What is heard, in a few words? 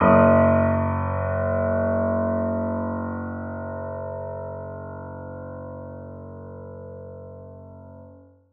piano f f#1, Grand Piano; Sonatina Symphonic Orchestra